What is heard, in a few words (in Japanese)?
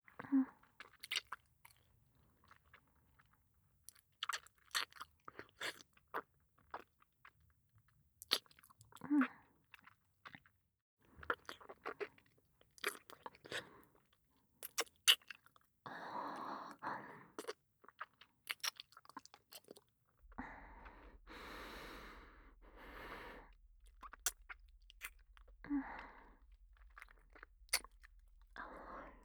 キス, ASMR